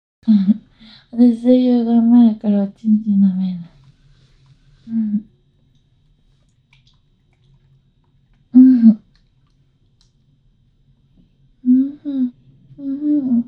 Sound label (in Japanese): フェラ; SuperASMR; 声優志望のトー横キッズに収録の仕事のテイで生エッチASMR収録しちゃいました。